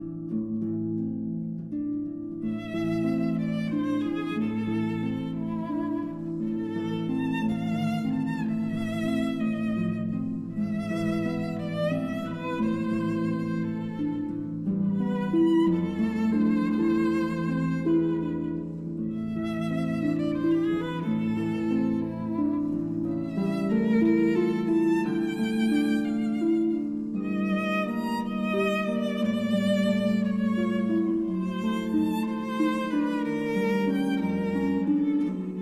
Berceuse, for violin and harp

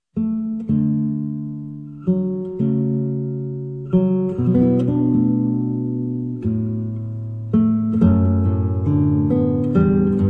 guitar; Guitar Suite; For an Old Friend at Christmas; III. Lento

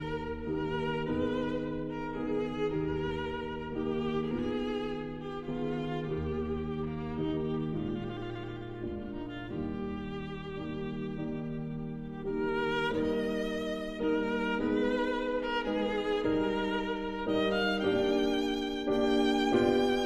Sicilienne for violin and piano

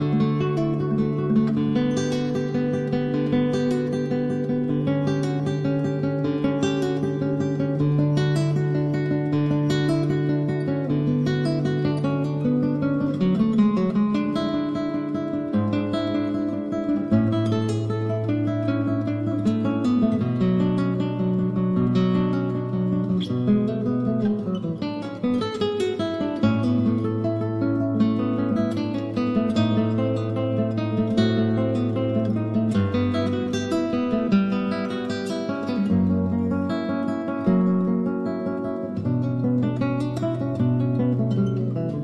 Cello Suite No. 1- Prelude

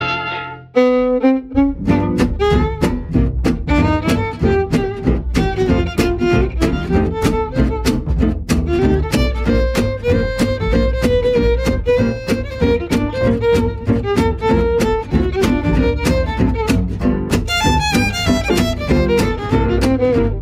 Swing Quartet

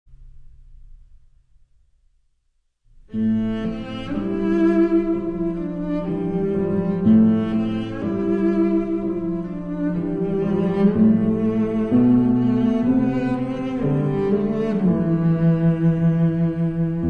piano; Mother Goose; Pavane of Sleeping Beauty

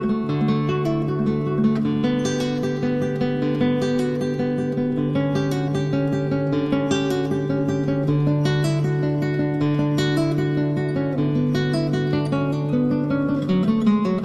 Cello Suite No. 1- Prelude

